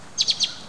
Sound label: mockingbird; mockingbirds